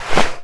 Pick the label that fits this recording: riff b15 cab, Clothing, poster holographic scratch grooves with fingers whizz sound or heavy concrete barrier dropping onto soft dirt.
Clothing